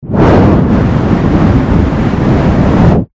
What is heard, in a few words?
jetpack